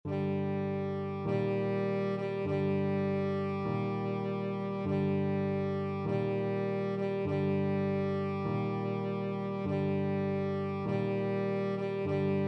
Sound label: tenor saxophone